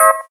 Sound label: gayageum, keytone; SKY IM-A830S-VEGA-Racer-2